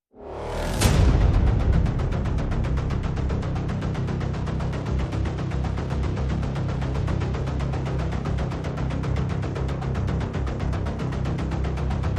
Percussion Pulses and FX

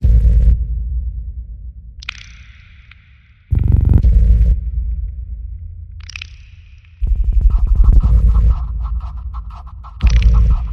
Eerie Cell Phone Rumbler

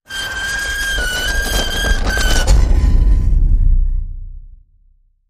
Fingernail Scratches Hit

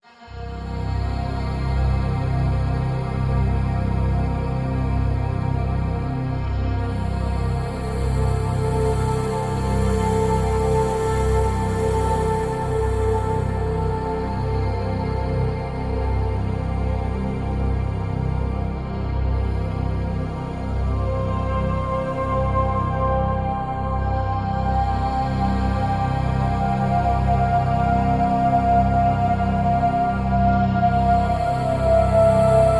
Solstice Drone

